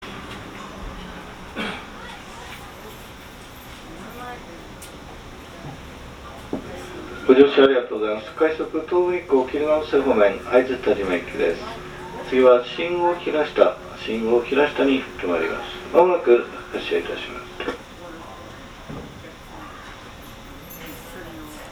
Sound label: tobu 6169, train motor sound